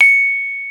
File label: BRONZE PEK, Bronze Gamelan